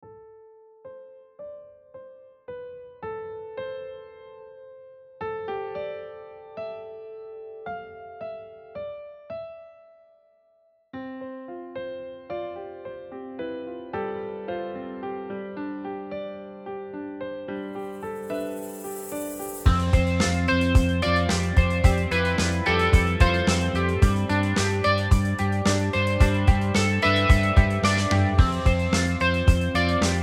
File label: Rock